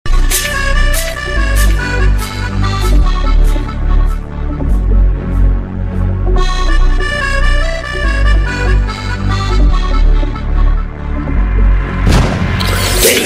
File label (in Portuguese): hip hop; tiroteio na favela